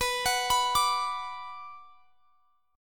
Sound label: B Minor B; arpeggiated